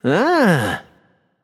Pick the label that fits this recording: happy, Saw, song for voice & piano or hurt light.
happy